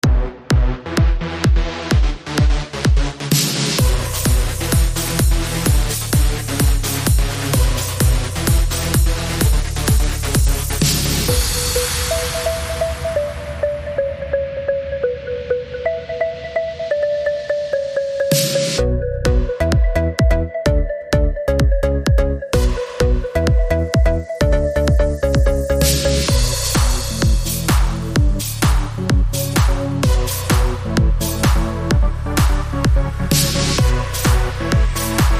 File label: Boost the Bass